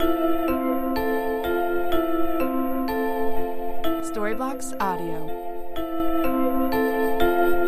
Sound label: Ambient House China Bells 125BPM E